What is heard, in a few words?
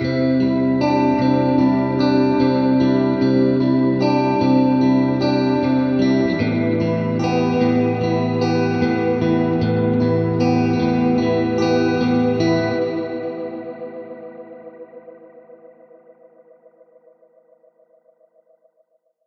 guitar warbly howl; Galaxy